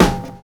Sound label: NO RING; Univers Sons